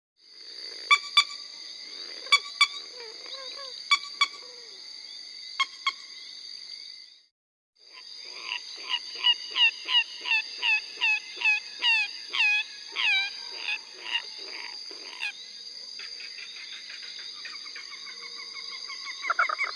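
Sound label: Gallinule poule d'eau Common Gallinule, chants des oiseaux; Guadeloupe; Comon Gallinule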